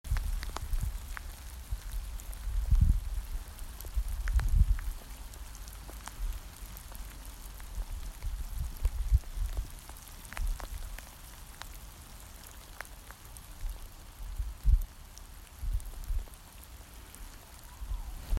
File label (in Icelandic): Umhverfishljod